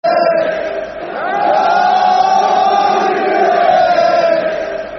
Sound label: Football Chant (Soccer Song); Charlton Athletic; fanchants-com